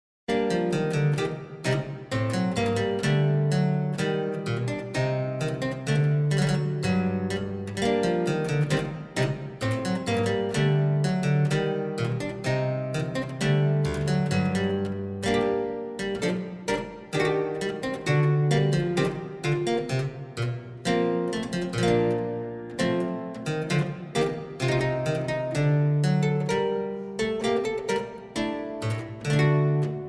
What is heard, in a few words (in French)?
clavecin luthe; celle qui fait tout mon tourment airs serieux et boire h450; chantons baroque